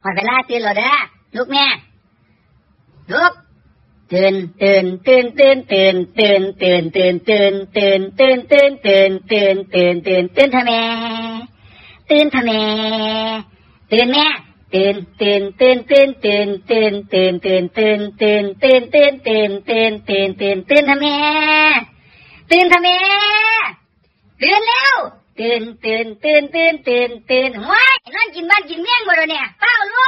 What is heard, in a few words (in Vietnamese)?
nhac chuong bao thuc